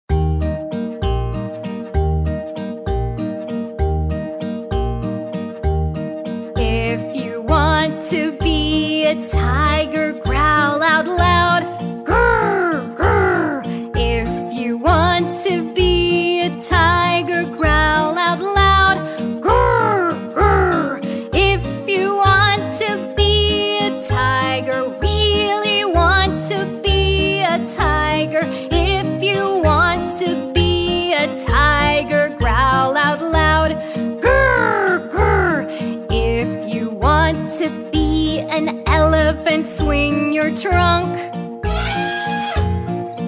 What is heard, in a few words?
Jungle Animals Song Kids Songs Nursery Rhymes